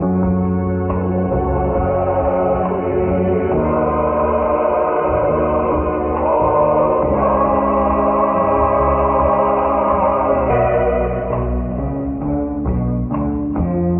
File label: voice noel sung; Sad